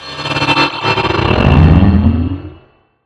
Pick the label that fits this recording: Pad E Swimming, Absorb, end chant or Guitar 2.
Absorb